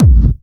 hardbassdrumz, breakcore; Bazzdrumz

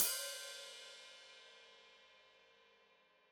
Hat Foot Open, Hi-Hat; Deeply Sampled Drums

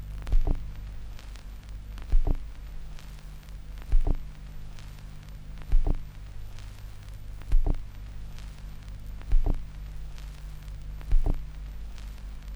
Vinyl Runout; layering

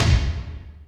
Tomb Slam, Drum Machines Alesis D4

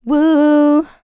LICKS, Vocal Planet, R&B; ILIO; Dance; LT R+B

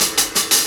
Jazz Hats, Drum Loops

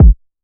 Kick 808; 808Flex; POMMESFRITES

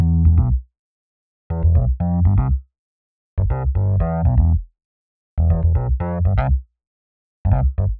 Rhodes Bass E, 120; PowerFX - Nu Jazz House

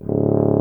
tuba C; Miroslav Vitous; Solo Instruments VOL-2

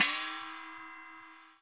GONG; ILIO - Ethno Techno

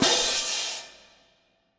Cym shorter, Cymbals, Orchestra; 90 s Sample CDs; PowerFX - Downtown Orchestra

